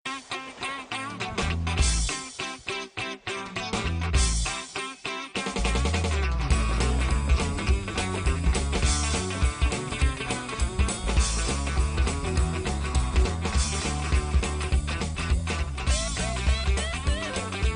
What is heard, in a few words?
Cowboy Funny Mix